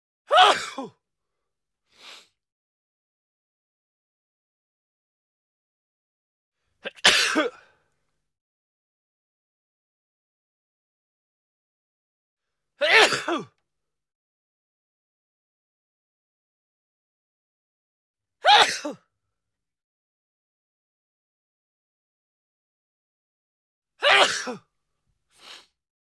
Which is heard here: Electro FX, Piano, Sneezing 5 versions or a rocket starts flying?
Sneezing 5 versions